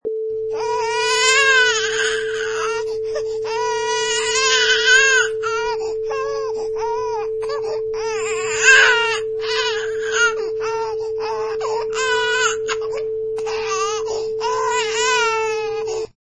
Baby Crying

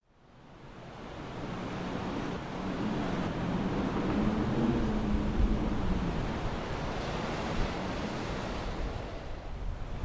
Approaching Thunderstorm

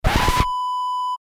record scratch

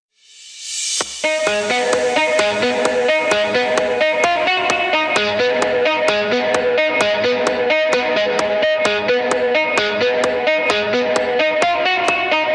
Hip-Hop, 130 bpm

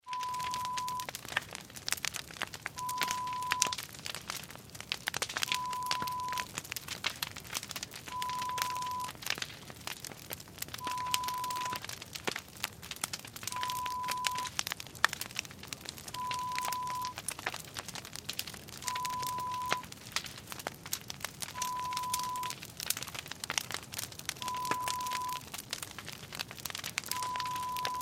Fire sound

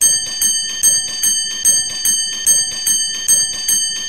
box bell tone

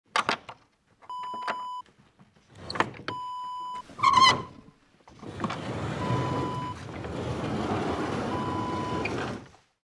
wooden sliding door

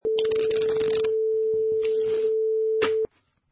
Card Shuffle

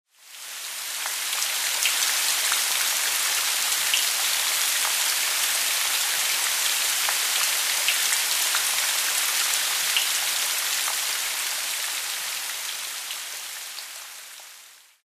Calmsound Rain

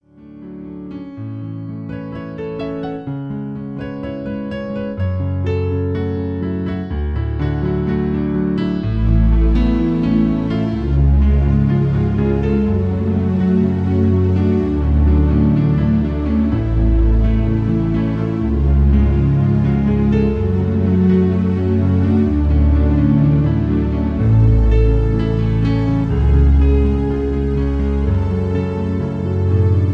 Piano Version

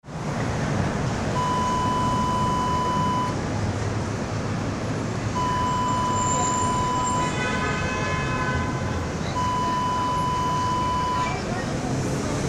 city ambience

